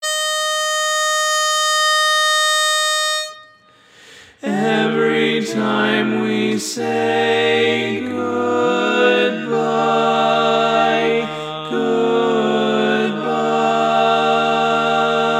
Bari, barbershop tags

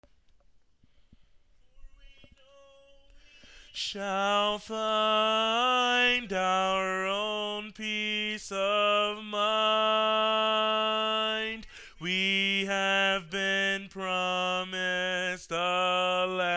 Bass, barbershop

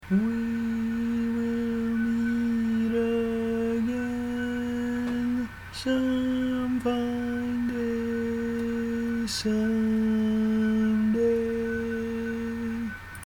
Lead, barbershop

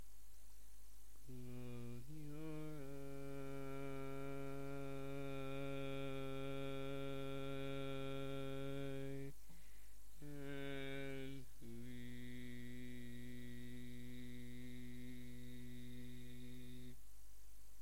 5 parts Bass, barbershop tags

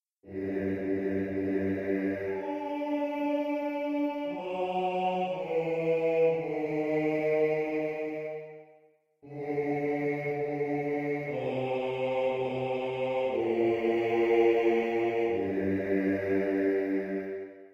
Bass, barbershop

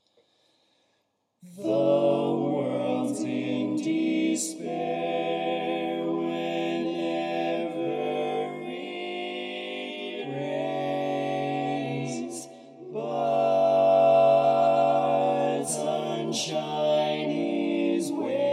Lead

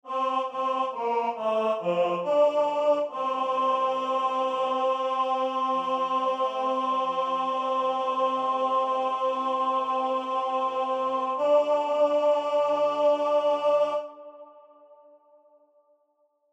Lead, barbershop tags

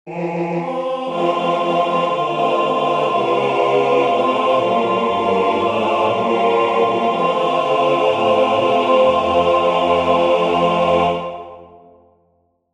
All Parts, barbershop

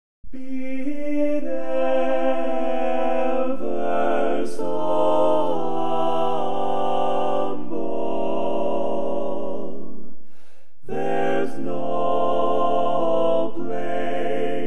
Bass, barbershop

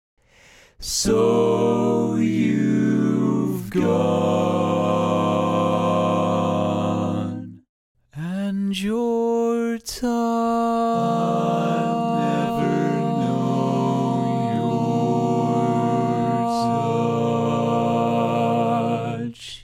All Parts, barbershop

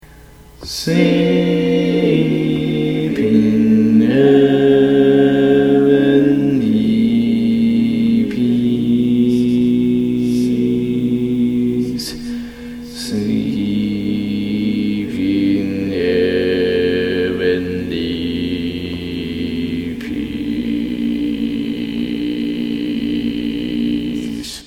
Bass, barbershop tags

